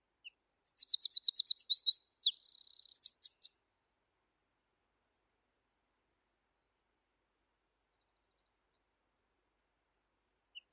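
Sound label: wren, Troglodytes troglodytes; krapivnik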